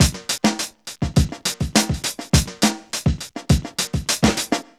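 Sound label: 103 bpm, Funky loops; Zero-G; Funky Elements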